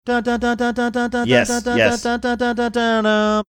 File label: singing portion